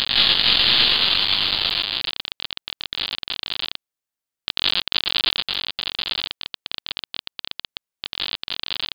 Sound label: microsound